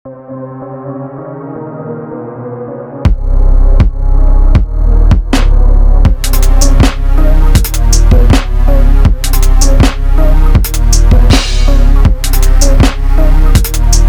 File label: summer clear lead